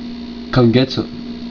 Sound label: remote; WICKED